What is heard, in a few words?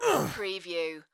Pain Umph, Male Painful Grunt, Hurt